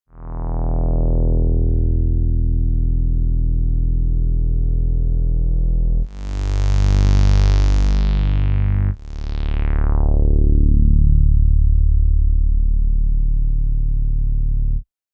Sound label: Kaox analog 96K